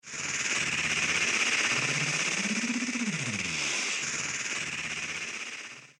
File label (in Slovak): vtak; botanicula